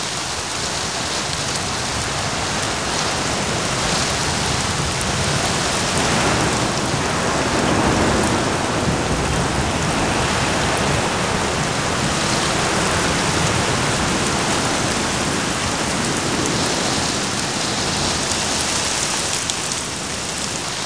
rain, ambience; XDM

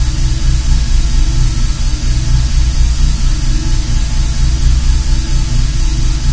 machinery; XDM; ambience

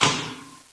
heavy switch, buttons; XDM

